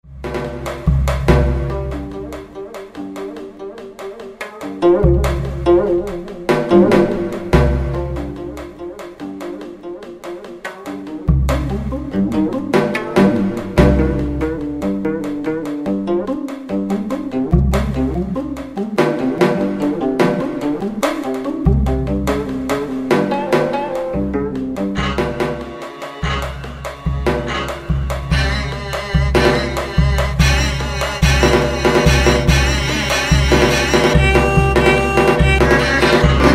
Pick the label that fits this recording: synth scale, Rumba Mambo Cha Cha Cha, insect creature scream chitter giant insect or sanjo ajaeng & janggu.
sanjo ajaeng & janggu